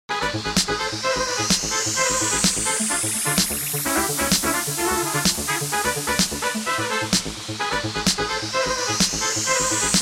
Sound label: Fiesta Elektronika